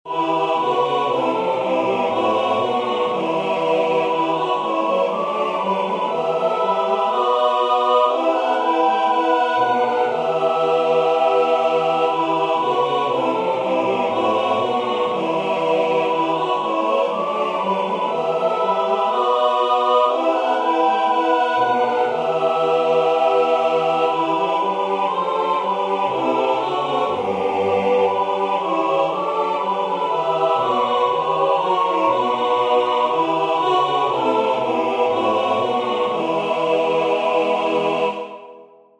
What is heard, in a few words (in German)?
Choral; Jesu meine Freude